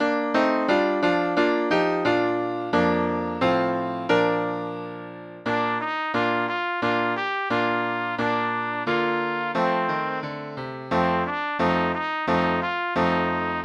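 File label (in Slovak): pre trúbku a klavír; Zvonia zvony vianočné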